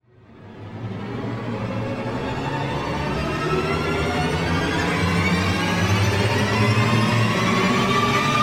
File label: Dramatic Music; Pizza